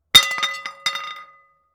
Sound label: Glass Plate Lands On Floor